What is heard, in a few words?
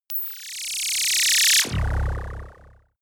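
splutter noise short, science fiction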